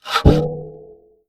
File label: sound Shop Error; freedom fall